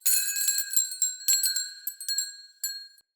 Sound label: Tiny Bells A; Fey Hub